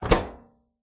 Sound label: machine open; factorio; Factorio 0.14.21